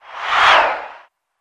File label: Football Crowd Dopp, sports arena football